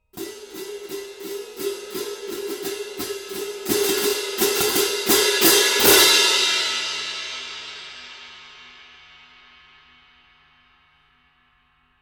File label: 19in zildjian classic orchestral medium